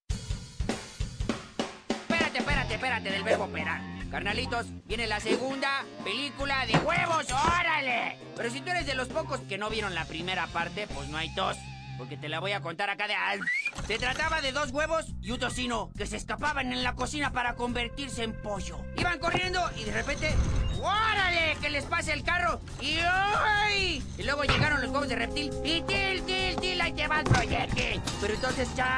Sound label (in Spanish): Huevo Carton